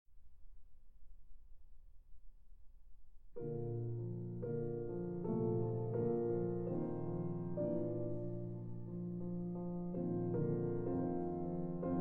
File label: Piano Sonata No. 21 in B-Flat Major, I. Molto moderato